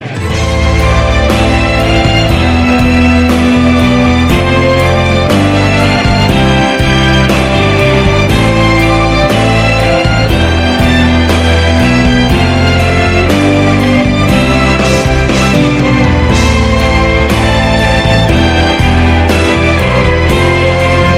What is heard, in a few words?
Kiseki Instrument